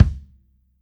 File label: soft hit whistle